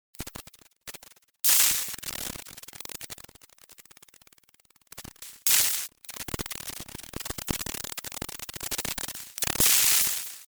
SHORT CIRCUIT

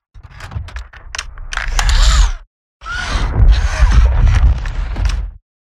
ROBOT SMALL

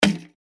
UI invalid sound

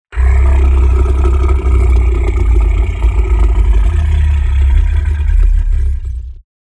Alligator tiger

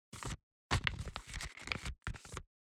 MOVMENTS paper

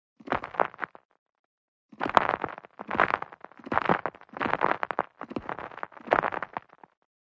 gravel road

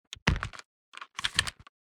Ranger movement

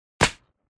BOW hits

